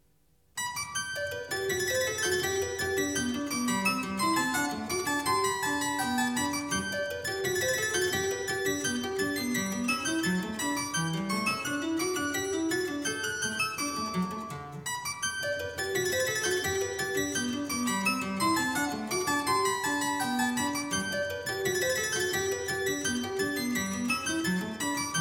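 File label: G major, Bourrée; French Suite No.5; BWV 816; BACH; CLAVIER WORKS; FRENCH SUITES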